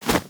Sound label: Arm Swing Foley; Warframe; Purgatory Warrior